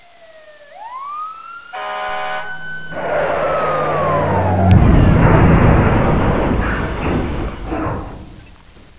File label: twn crash, ambience